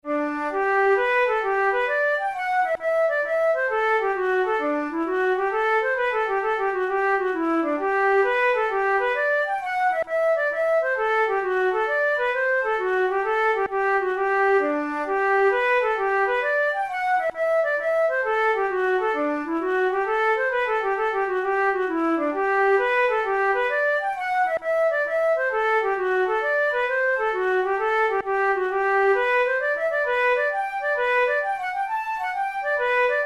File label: hornpipe, flute tunes